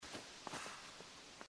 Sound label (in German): springen; inside